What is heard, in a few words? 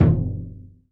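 brush bass drum soft; Northstar - New Gold VOL-2; 30S BRUSH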